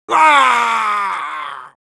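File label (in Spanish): Sniper pain critical death, es; *Grito de muerte*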